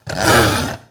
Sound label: Red Mana Wyrm Attack